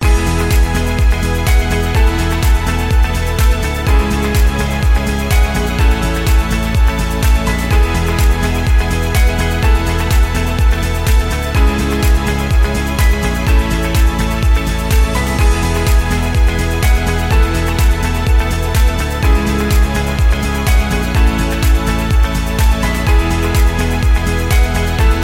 Positive Background (Loop 2); MIUI12

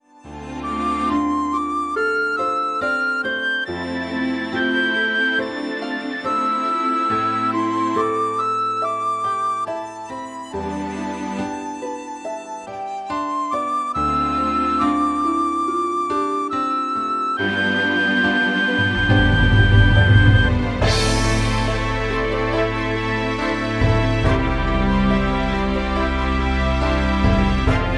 Sentimental Symphony, ringtone

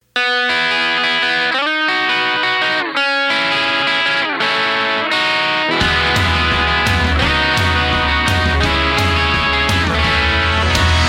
Motivational Rock, ringtone; MIUI12

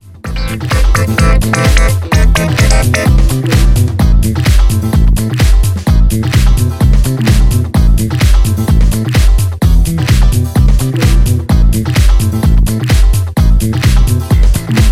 Funky Swing

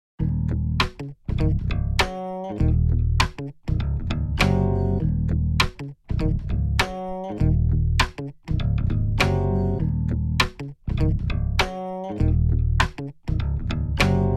Funky Walk, ringtone; MIUI12

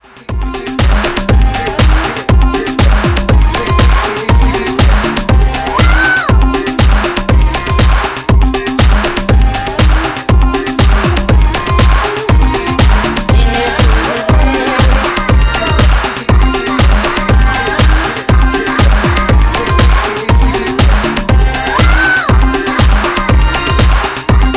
Special melody, ringtone; MIUI12

